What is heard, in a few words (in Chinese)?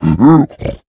猪灵待机声